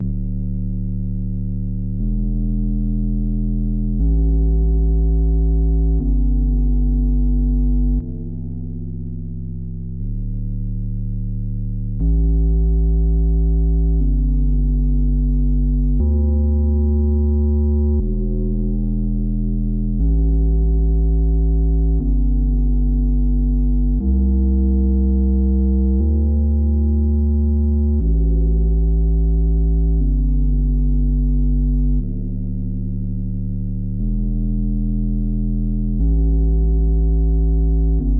Underscore Bass

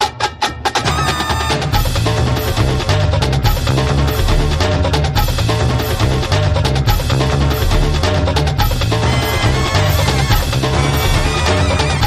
Plum Drums

